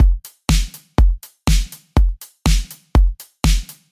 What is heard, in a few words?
122 BPM Full Drum, full drum kit; Battery; Essentials Pro